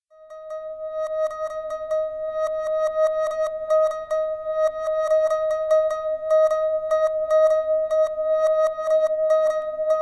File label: string beat thing